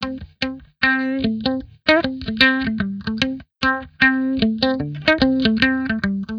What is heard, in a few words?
Mute Phasor; Reggae (Регги)